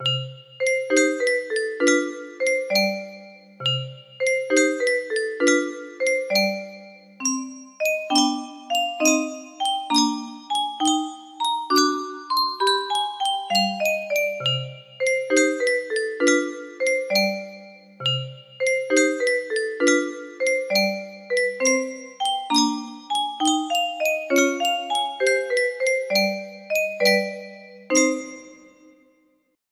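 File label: funeral march, music box melody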